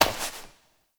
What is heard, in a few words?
Step Flesh; Warframe; Koumei